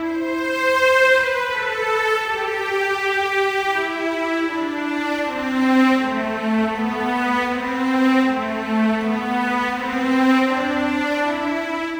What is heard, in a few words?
Cello; виолончель